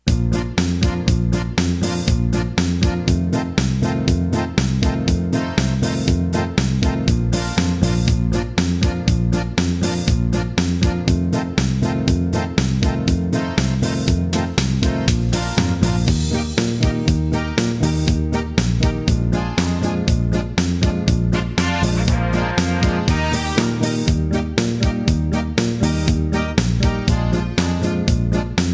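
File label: Pop Polka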